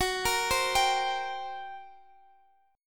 G Minor Major 7th Double Flat 5th, Gb

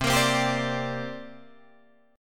C 7th Suspended 2nd C down Guitar Drop D

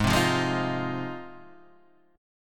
G sharp 6th Guitar, chord; strummed; Standard; JGuitar

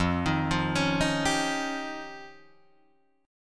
F 7th Suspended 2nd Sharp 5th, Guitar, Drop D

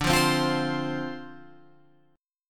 D-Minor 7th D Guitar Open G, chord

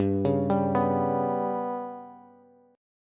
G Major Flat 5th Bass, chord; GMb5 arpeggiated; JGuitar

